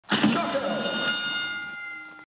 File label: a knockout sound; cuphead